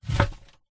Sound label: horror coffin mud dirt small stones hitting coffin box being burried internal; internal coffin pov